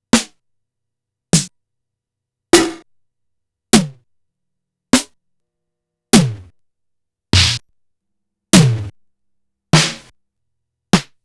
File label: SDS-1000 Snares